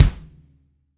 clicky kick drum sound d sharp key; kick drum samples

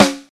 good acoustic snare C sharp key

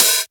clear open hat one shot D# key

